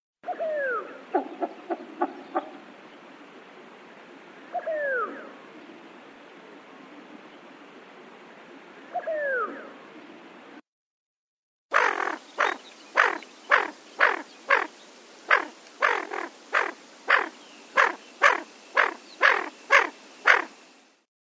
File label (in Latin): Aythya marila; aves